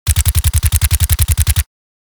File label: MP5 submachine gun full auto fire; war; gfxsounds